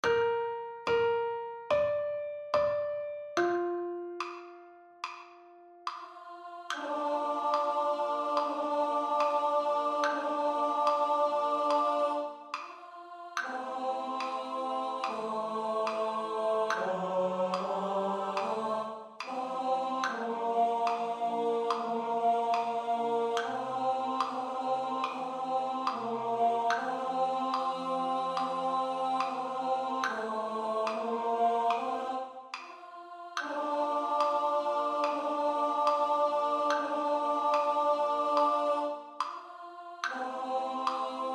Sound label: TENOR STUDY